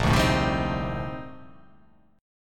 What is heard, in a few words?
A sharp Major 13th A sharp